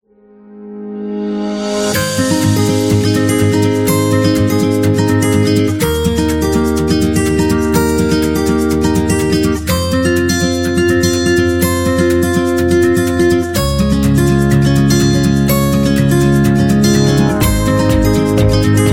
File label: Romance